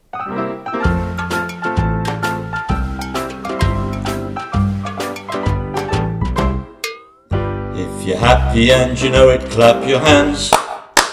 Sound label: Happy song with voice